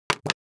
drop top shuffled, small cardboard flat cardboardpiece